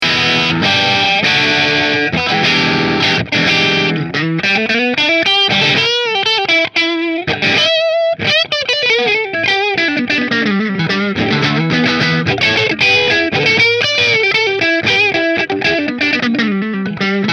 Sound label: Middle Marshall; Nola Voodoo Tabacco